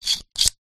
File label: metal small clip slide squeak; foley